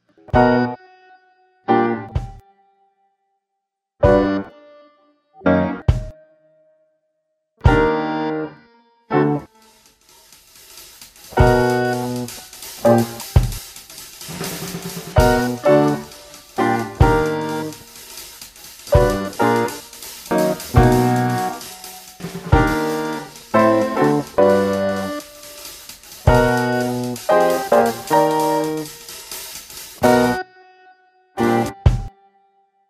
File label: Invention Number Three Microsteps